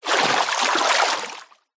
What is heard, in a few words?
nature water movement surface splash light hand; zapsplat